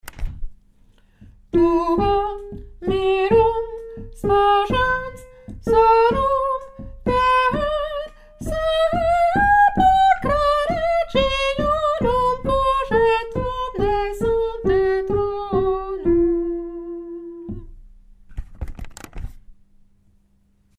numero 2 mesure 93 soprano

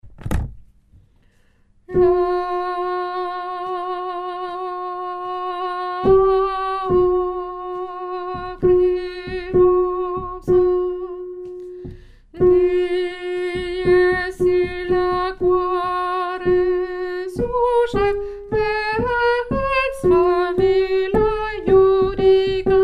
lettre B tenor; chorale

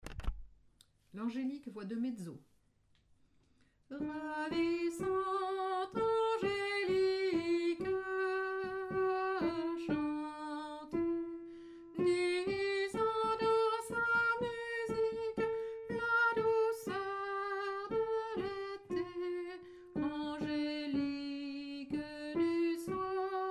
mezzo, choeur femmes

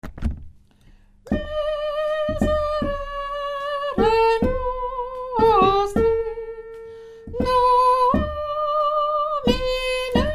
te deum soprano, chorale

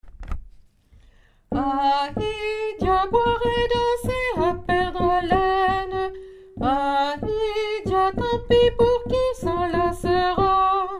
chanson villageoise alto, chorale

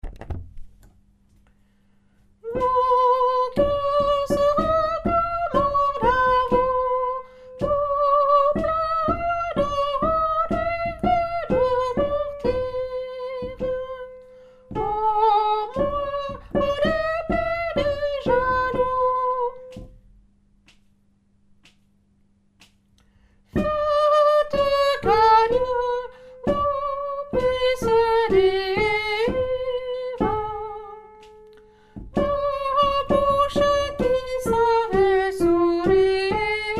mon coeur tenor

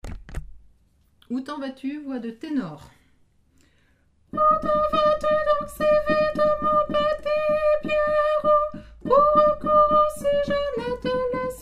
tenor; ou ten vas tu

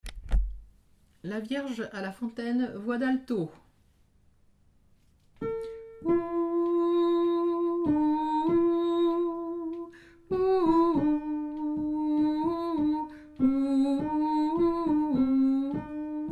vierge a la fontaine alto, chants noel

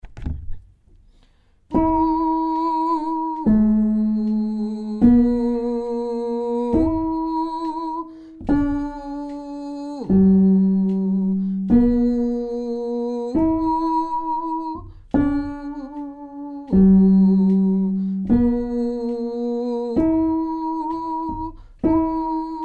basse, chorale

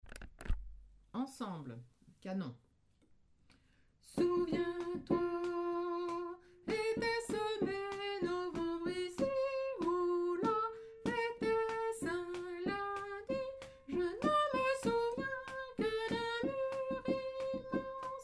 ensemble; choeur

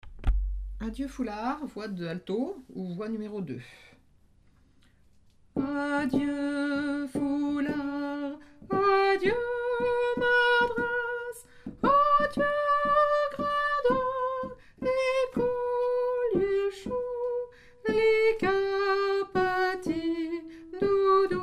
adieu foulard voix 3

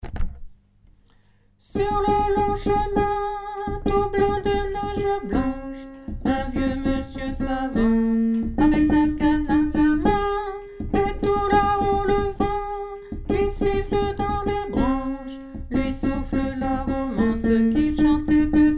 vive le vent basse; nouveaux noels; chants noel

